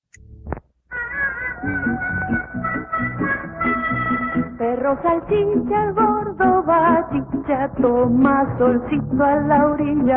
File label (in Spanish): Las Vacaciones de Salchica Canción Infantil